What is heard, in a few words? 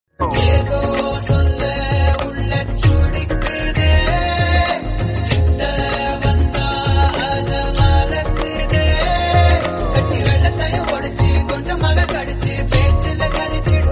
Tamil Song